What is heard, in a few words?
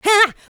Vocals, Adlibs; Houseworx